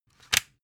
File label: cd cover close hard